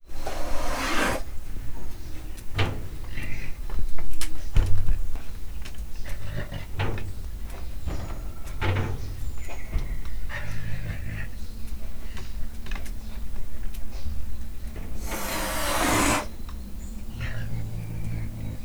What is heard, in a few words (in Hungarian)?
sivatagi hiúz, felbosszantott állat; állathangok; miskolci zoo 2009